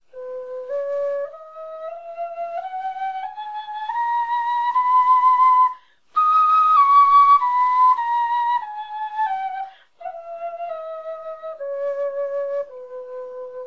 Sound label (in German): tonleiter, knochenfloeten